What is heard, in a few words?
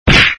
SFX sound (alien small punch); Red Baron